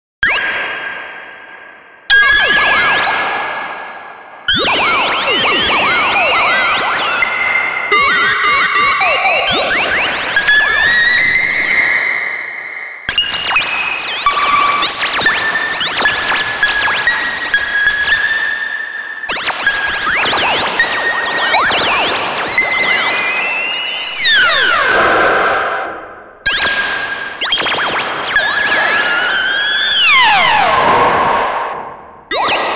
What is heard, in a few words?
Synthi V